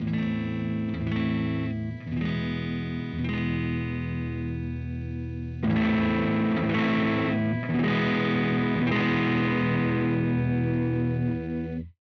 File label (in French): effet overdrive